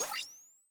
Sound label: skill charge loop